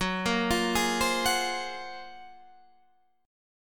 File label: F sharp Major Sharp 11th, Guitar, Drop D; chordshape; down